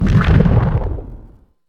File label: mining; orbit